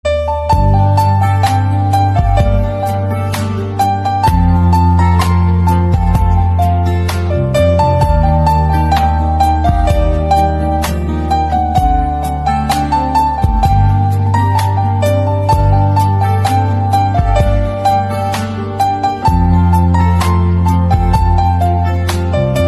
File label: Piano Instrumental Ringtone